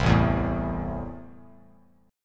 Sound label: A Minor, Bass Standard; Am strummed; 8,0,2,2; JGuitar